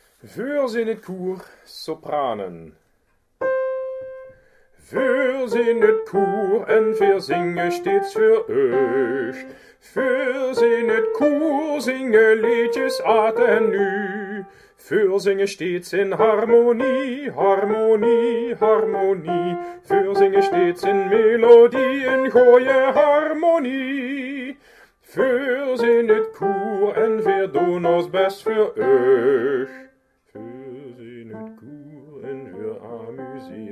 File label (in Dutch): Sopraan